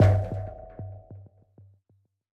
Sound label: Jingle Negative; Super Rub 'a' Dub; PS3